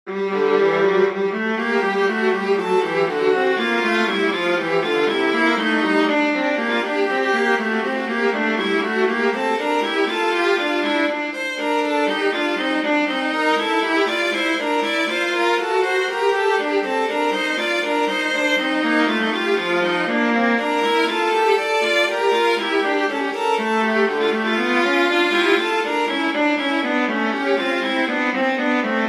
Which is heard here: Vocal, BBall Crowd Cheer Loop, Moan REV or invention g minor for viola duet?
invention g minor for viola duet